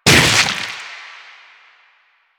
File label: Arm Attack Hit; Enemies; Warframe; Proto Infested; Runner